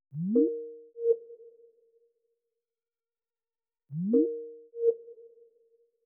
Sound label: Call Reconnecting; Teams